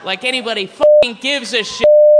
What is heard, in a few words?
shh